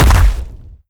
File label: stomp, antlion step